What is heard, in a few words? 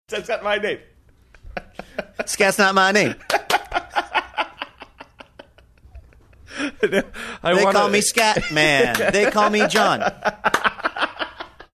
singing scats not my name